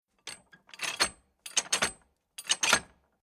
Rattling Old Metal Movements Mechanical Creeking Rickety Various; Tom Hutchings